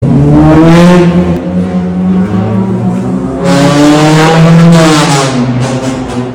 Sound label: distant rev loud; spatial